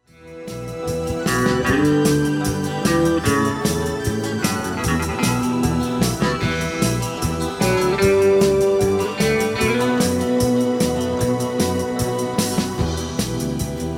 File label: strum; Monjiro